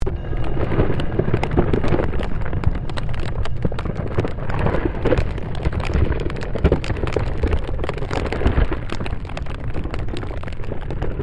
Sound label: Created Magic Spell Glacier Quake